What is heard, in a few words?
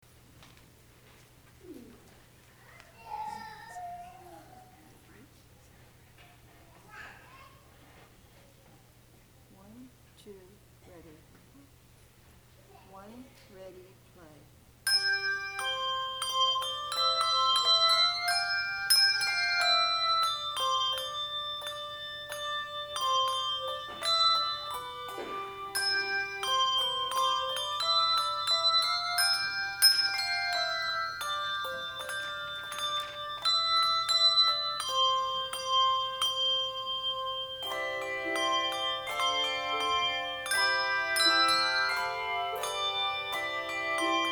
BELLS; SIMPLE GIFTS